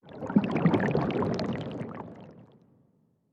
ambient battle; World Of Warships

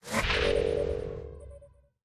player stat on; War Thunder

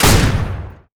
Aircrafts Mi-8MTV2 Squib; DCS

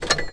enter; World of Tanks Blitz; GUI menu battle

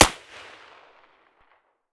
p226 fire far; Escape from Tarkov

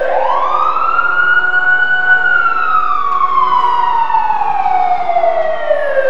siren firetruck

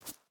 footstep boots grass jump start; Rust

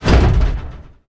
impact car light

